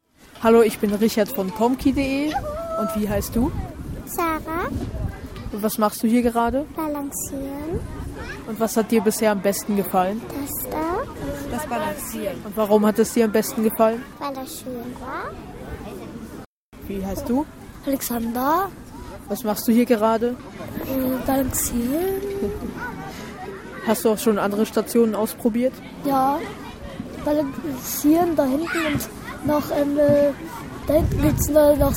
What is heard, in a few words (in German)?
kinder balancieren; 30 Jahre SpielPark; Sport